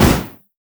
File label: turret; LoL; heimerdinger